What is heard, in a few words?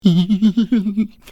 Pig fearful grin; bad piggies; android; mobile; windows